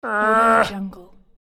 cartoon monster disappointed

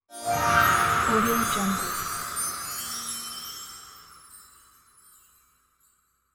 magic christmas whoosh

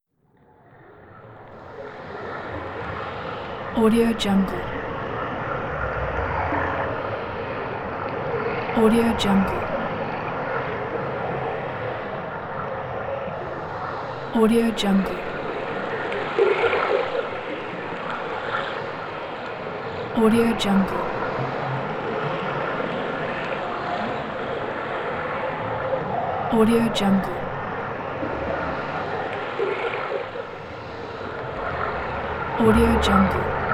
evil water

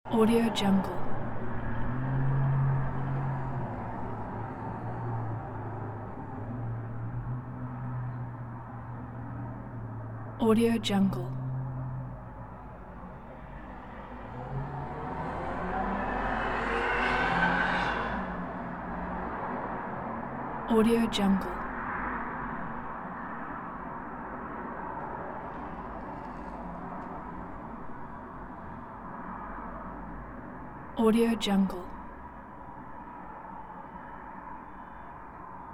bedroom ambience with open windows

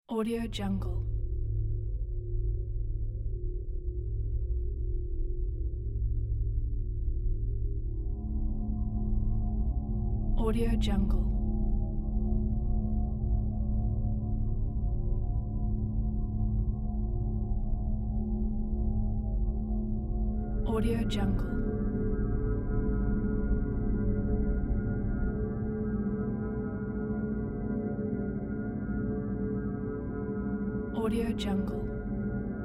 drone

